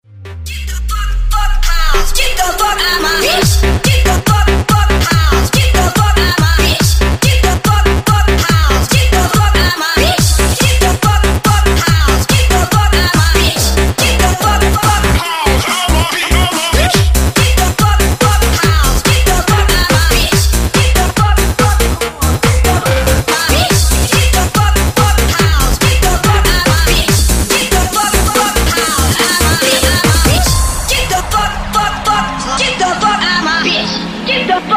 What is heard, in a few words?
BASS Funky; Funky Tunes Vol. 1